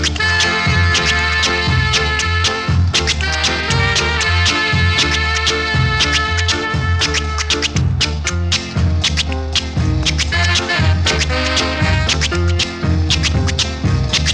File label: reggae